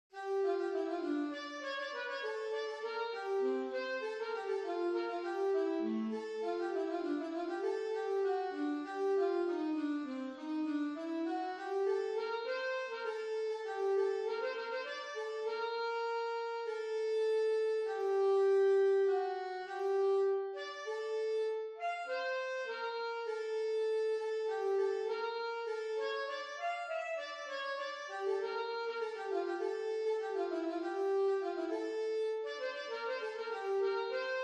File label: Sax Excerpt